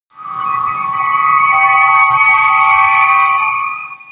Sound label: transporter